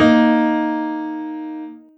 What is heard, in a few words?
Two Bb3 Eb4; osu